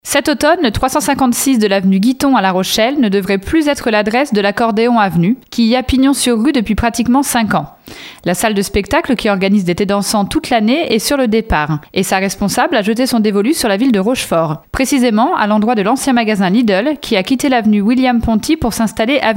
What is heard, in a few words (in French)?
accordeon